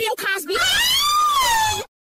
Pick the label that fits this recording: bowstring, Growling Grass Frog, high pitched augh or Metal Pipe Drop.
high pitched augh